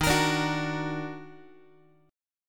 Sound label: D sharp Minor Major 7th, Guitar; strummed